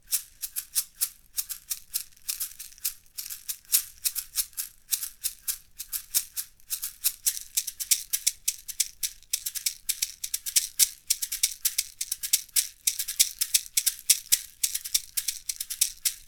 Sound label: caxixi